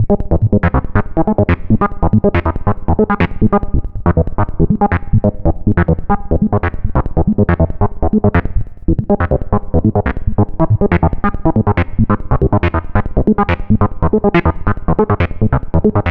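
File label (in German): Pocket Operator Modular 400 SAW SQR PWM ENV auf Filter, Synthesizer; Teenage Engineering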